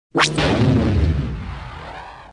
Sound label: guns x wing